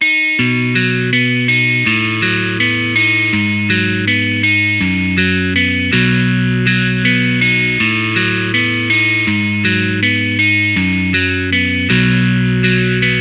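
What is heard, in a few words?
Motif guitar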